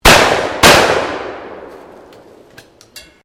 SHOTGUN 12 GAUGE FIRING 2 SHOTS, Sporting Shotguns